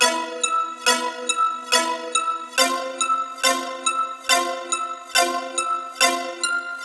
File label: Riddim Synth Loop E (140); Dusk; Ghosthack; Riddim Essentials 2020